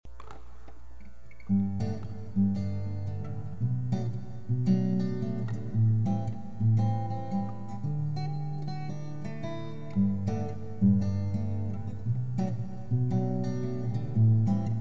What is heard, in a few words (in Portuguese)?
vivo acustico